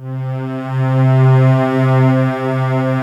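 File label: PWM strings C3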